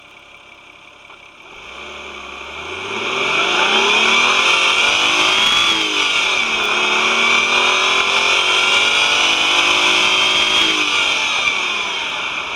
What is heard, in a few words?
engine